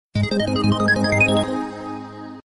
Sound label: soft sms